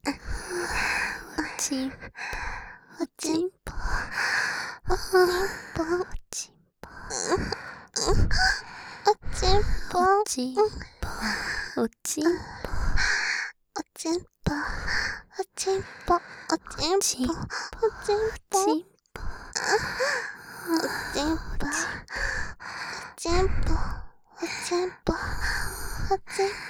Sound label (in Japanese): 喘ぎ; お屋敷メイドのハーレム淫語ヘヴン 気が済むまで耳舐め淫語を堪能できるバイノーラルオナサポ音声